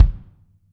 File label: R B Kick Close, drums, One Shots; Sonic Foundry; Sony Creative Software; Drums from the Big Room The Mixes; R B Kicks